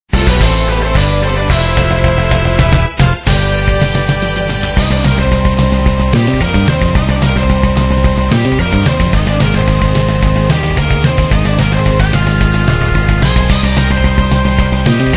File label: Battle; Dot Quest; android game